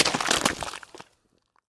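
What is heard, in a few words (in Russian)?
неприятный который тянущийся звук лопнувшего об землю шарика с водой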